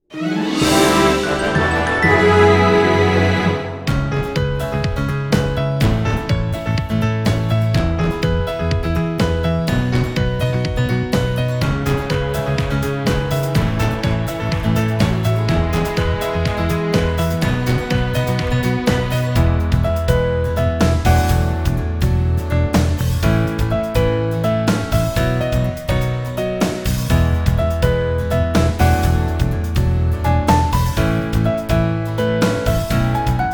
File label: (Jingle) Celebration; balan wonderworld